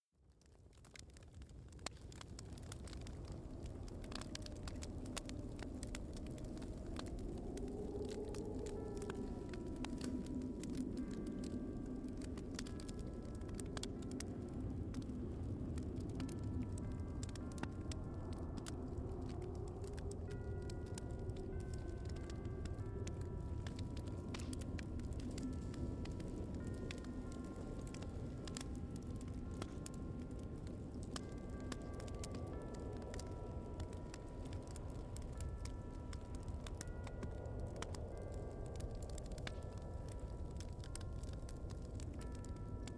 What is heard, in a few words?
Ambience Track